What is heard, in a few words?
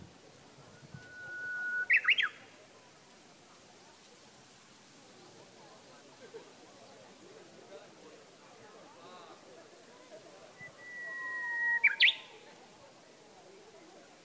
uguisu